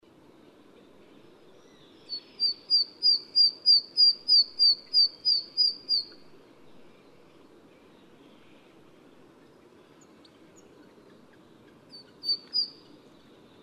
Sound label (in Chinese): 青背山雀; 綠背山雀; 山雀科/留鳥